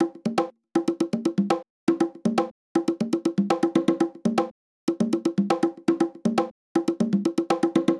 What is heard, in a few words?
Conga, Beats, Nu Jazz House, 120; PowerFX